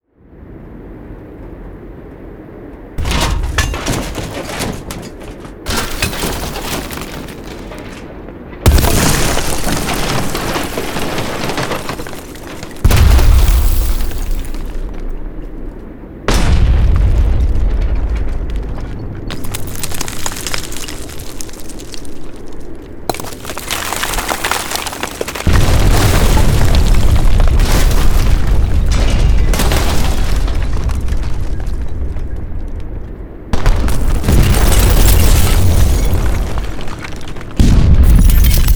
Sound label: Bomb Blast Explosion and Debris Sound Elements; Bluezone